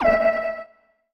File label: ui 321; Space Wave Race